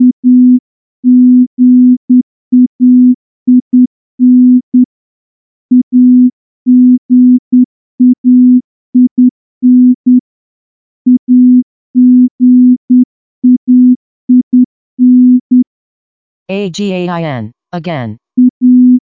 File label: morse training 10WPM